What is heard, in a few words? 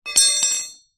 foley metal rod down on ground